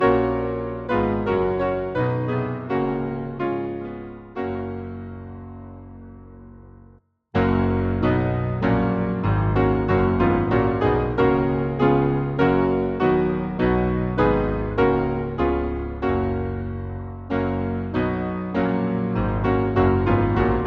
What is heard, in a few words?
Hymn, piano; Guide me O thou great Jehovah; Cwm Rhondda